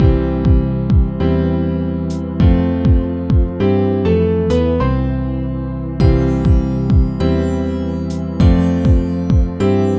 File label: MUSIC; Child Protection Smart kit